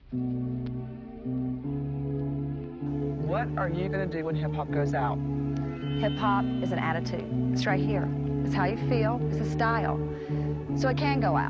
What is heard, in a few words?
viola, LoFi Hip Hop Chill Beats